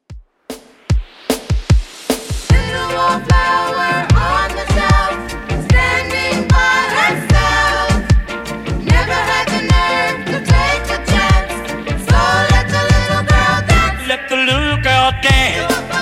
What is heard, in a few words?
60s Redrum 150bpm CLEAN